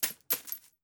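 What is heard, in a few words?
bullet case bounce 556 grass; gmod; viper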